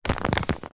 paper sheet on soft surface hand rub over crumple rustle lightly